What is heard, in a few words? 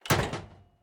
minecart switch